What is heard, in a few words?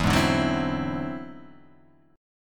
E Augmented 9th E down; E+9 strummed; JGuitar